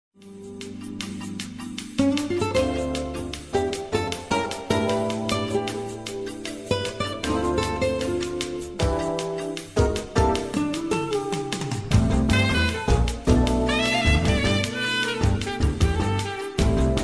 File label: jazz pop